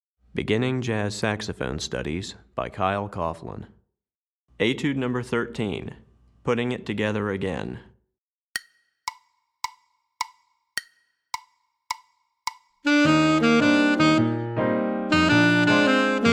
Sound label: Alto Etude, Saxophone; Beginning Jazz Saxophone Studies Alto V2